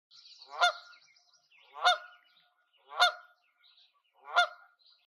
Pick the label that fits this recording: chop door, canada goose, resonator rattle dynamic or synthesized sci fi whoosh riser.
canada goose